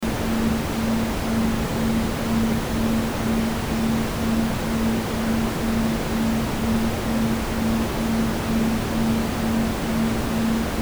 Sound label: Noise Deep Sleep